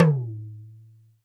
Talking Drum melo; E-MU Producer Series Vol. 7 – Old World Instruments

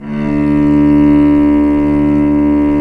cello, Arco Solo; String Master Series

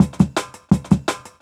Tin Hat; Original Jungle Breaks

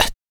MALE PERC, Vocal Planet, Jazz FX; Spectrasonics

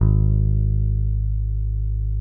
P BASS SUSTN, G#1; Sampleheads; Will Lee Bass Library

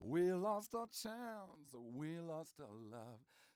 m 130 mel d-flat, Vocals

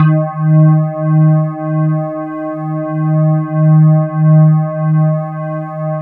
E-HARP, DX HARP; Wizoo - Powered DX

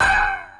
cymbal; Roland MC-909

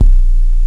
KICK, Hip Hop

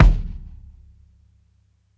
KICK SOFT, drums; BASEMENT DRUMS VOL 2

